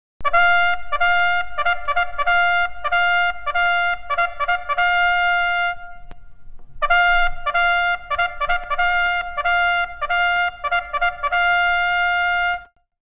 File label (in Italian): Allarmi; Associazione Nazionale del Fante - Sezione di Cividale del Friuli "Col. Pietro Benatti"